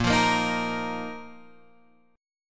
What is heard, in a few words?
Bb Suspended 4th, Bb, down, Guitar, Open G